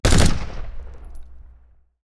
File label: Machine Gun Burst; Adobe; after effect; Action Essentials 2; Bonus Sound FX